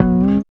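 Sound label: bass tone; X-Static Goldmine 1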